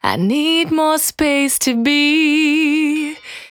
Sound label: Cry 68bpm B, Vocal; Zero-G